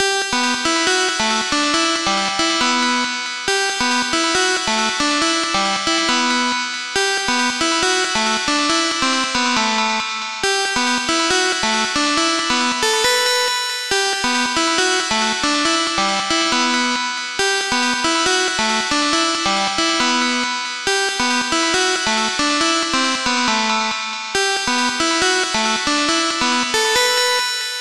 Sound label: Lead original; auto pan gate